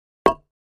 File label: Patio Square Impact, concrete & brick